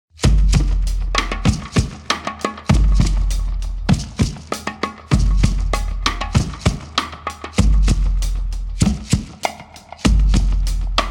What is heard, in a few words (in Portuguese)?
reggae interlúdio